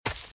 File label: Footsteps Leaves Sprint Left; RadwinIsland